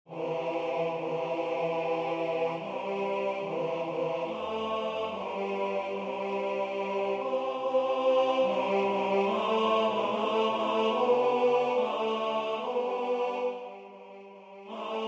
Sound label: tenor